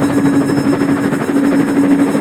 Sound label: wind ball overlap loop; smite; god merlin